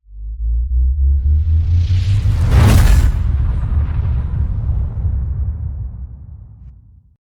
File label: open card